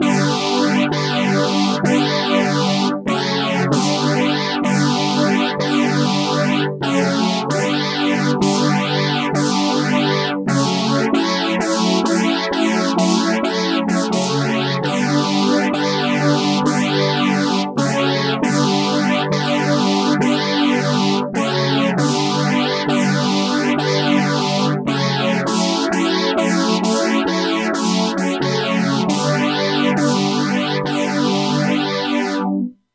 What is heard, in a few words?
saw phasers, yamaha reface cs; mess arounds